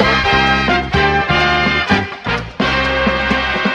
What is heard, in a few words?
horns seventies strut; Loops; Phonky